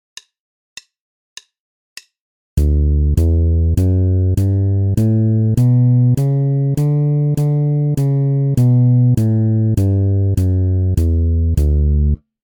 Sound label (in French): Gamme majeure de Re, basse; exercices